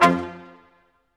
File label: BRS Quintet stac; BRS Staccatos; Roland L-CD702